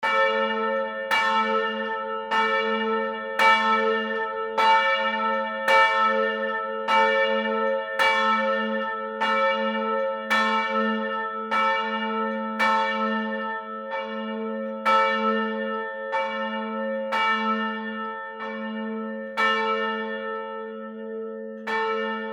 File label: church bell medium pitch